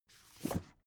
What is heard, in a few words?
book take shelve hard